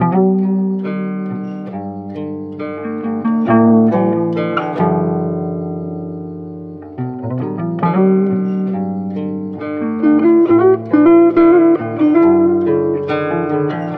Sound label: guitar sour notes; Loops; Sad